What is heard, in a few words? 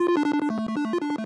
reel Spin; Diamond Empire